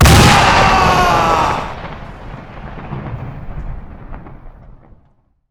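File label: infantry death; SuperPower 2 Redux V7A; unit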